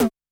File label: Electro Kit Tom